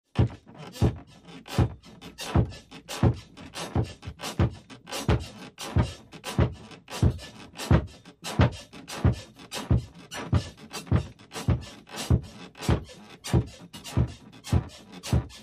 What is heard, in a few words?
Bed Bangs: Wood And Spring Creaks With Headboard Banging Wall.; beds